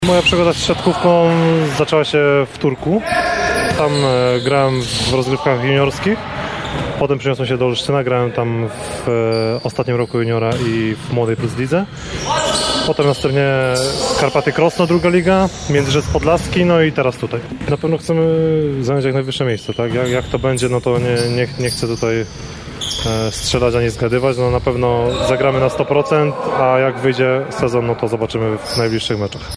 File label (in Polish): wróbel